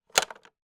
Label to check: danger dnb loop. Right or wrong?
Wrong